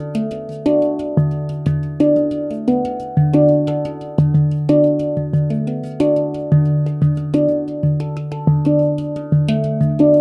90 A maj Hang

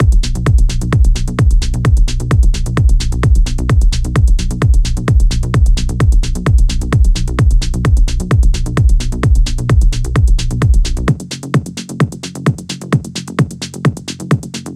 Drums 130BPM Bm Dark Bass

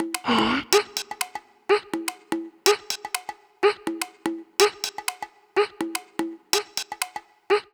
124 Percussion

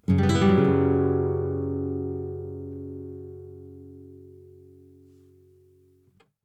BPM 94 Bm Flamenco Outro Chord

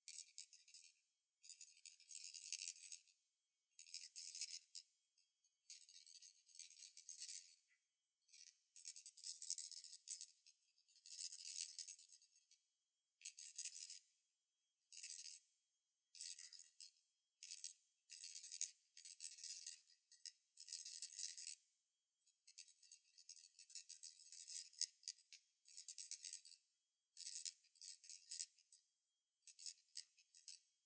120 Spinning Paperclip Foley

